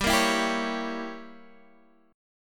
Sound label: G Diminished 7th, Guitar, Half Step Down